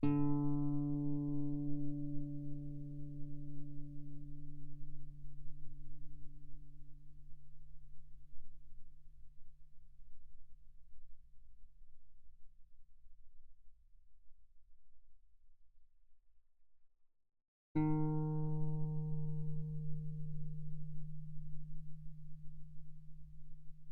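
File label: Guitar mezzo forte sul D D3 B3; mono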